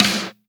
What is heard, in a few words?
Snares; Vengeance Samples; VEH2